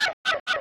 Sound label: Squeak